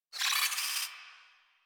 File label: Space Drone Death; Warframe; Corpus Space Drone